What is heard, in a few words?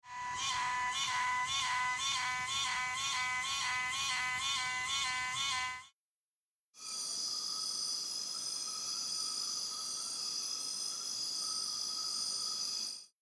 jungle Borneo